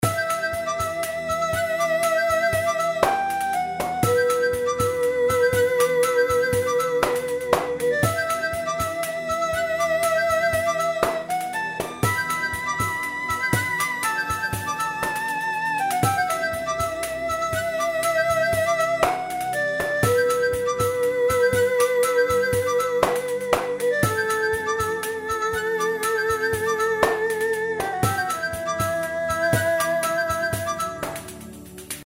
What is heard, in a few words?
bgm; Four Beauties